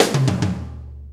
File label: 4-4 Fill, Drum Loops